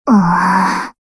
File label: match leave; AL Ayanami by [Winter]